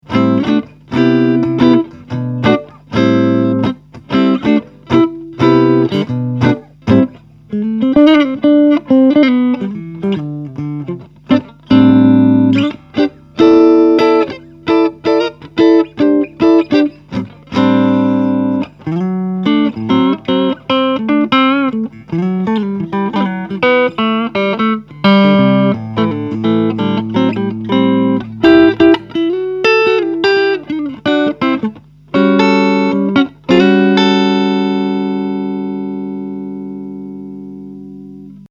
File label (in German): Gretsch hals jazzy